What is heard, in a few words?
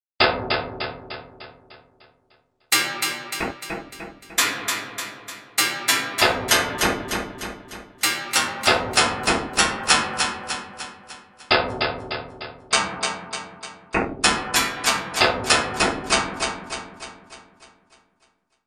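Metal brush, Chromatic hits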